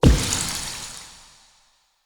Blood Lust Hit; Fey; Champion

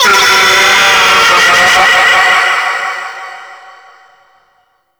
Space Drone Attack; Warframe; Grineer

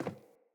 Sandals Run Wood, Footsteps

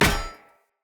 Sphere Polearm Medium Impact Default; Player; Environment

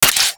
Crossbow Mech, Weapons; Warframe; Tenno; Garuda

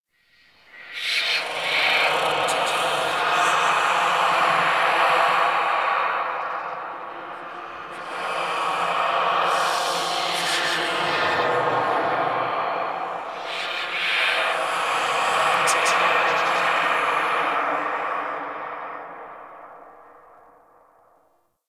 Whisper; Ambience; Dante Unbound; Warframe; Entrati; Dead End Secret

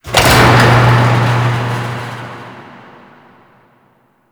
Tram Door Open; Grineer

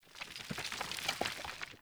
Grunt Foley Summon Dirt; Enemy; Characters; Corrupted Sproutfolk

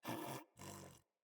Pet Snore; Catbrow; Pet Ship

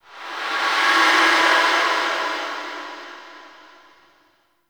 Zariman Whisper Air Draft

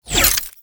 Pistol Projectile Pass By; Weapons; Warframe; Tenno; Temple Signature

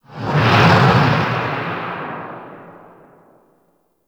Asteroid Pass, Fortress Ambience; Warframe; Grineer

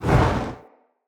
Player Torch Whoosh Fire; Weapons; Blunt

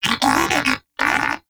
Moa Pet Tenacious Greeting; Pets; Warframe

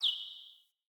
Cardinal Male Bird Impact Chirp

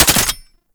Belt Fed Rifle Mech A; Warframe; Tenno; Frumentarius

